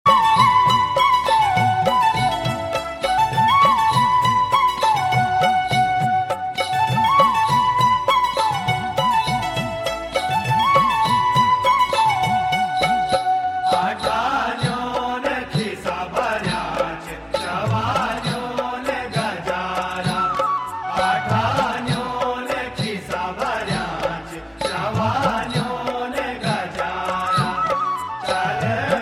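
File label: Garhwali tone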